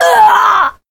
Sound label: death